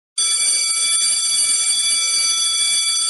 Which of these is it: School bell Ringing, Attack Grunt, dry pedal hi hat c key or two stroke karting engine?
School bell Ringing